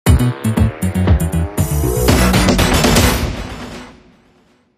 bon total win pop; aviamaster; Aviamasters

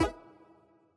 collect, bonus; aviamaster; Aviamasters

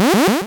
Power Up

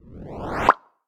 die; todee and todpee

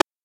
button Press; todee and todpee

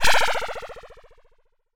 a UFO Make

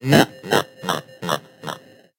Laugh; todee and todpee; Toodoo

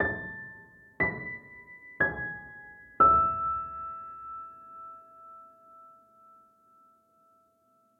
intro; todee and todpee

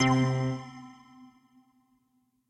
intro Worlds; todee and todpee

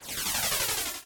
Spin

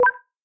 pick Key; todee and todpee

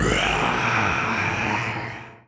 Scream; todee and todpee

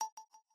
count end; aviamaster; Aviamasters

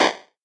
break Plat Break; todee and todpee

